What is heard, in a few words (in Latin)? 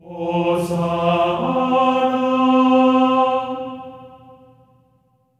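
hosanna F4; Spectrasonics; Symphony of Voices; GR HOSANA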